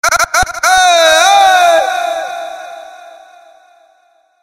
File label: EeeHee